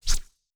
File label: Btn Switch